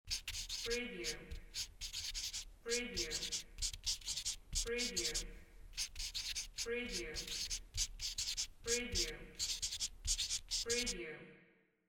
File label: marker writing